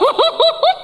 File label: laugh